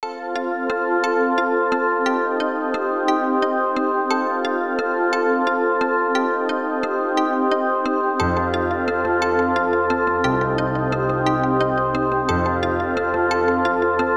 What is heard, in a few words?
Tyrannosaurus Alarm, phonetones, Sony Ericsson; G900; PlusFourSix; Lars Edvall